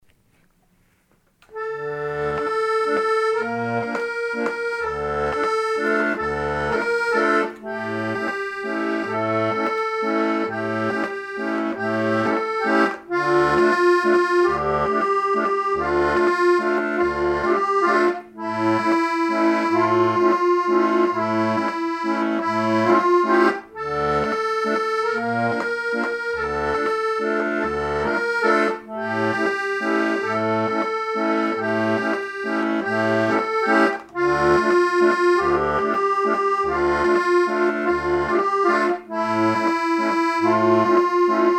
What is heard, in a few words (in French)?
Valse triste; franzosisch